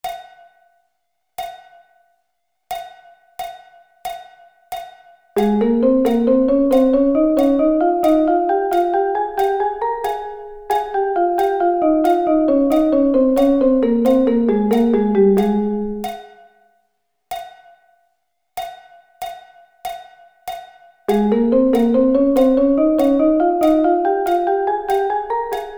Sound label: Ab Double Sticking